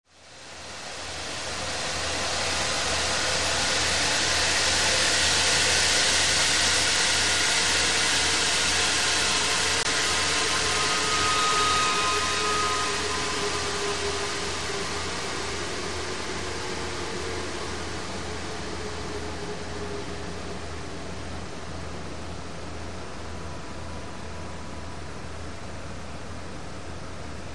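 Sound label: Eerie Heavy Steam Release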